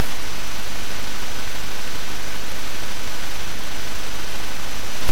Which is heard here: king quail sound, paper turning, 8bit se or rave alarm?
paper turning